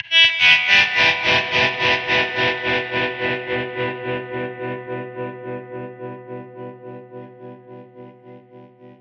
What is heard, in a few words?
guitar tremelo descent